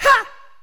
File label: "ha", Voice; ooh ahh